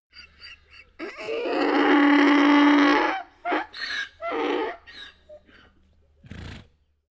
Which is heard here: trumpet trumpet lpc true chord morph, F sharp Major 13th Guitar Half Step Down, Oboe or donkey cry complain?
donkey cry complain